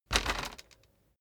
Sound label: bicycle street bump rear